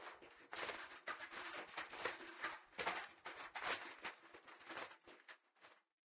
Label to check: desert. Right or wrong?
Right